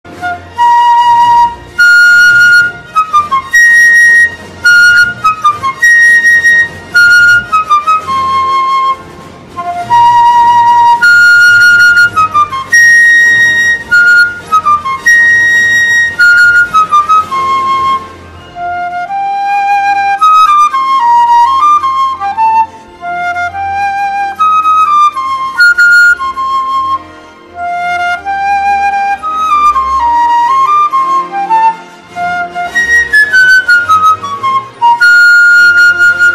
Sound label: Shitty knock off music